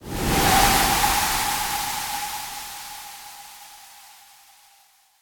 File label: Dis Snow